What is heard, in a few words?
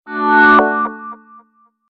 Nokia N9 Soft Msg